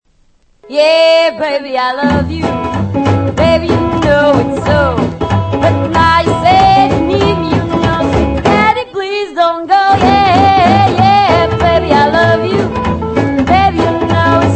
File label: rhythm in a riff